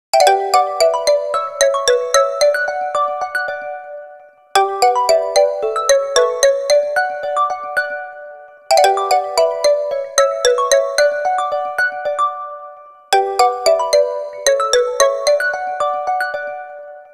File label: Neon, ringtones; Explay Neo